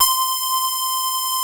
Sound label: TINY LEAD C5 R, KORG, Analog; USB Soundscan vol.49; Analog Fantasies; AKAI